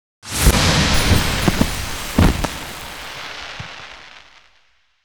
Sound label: large win delay; netent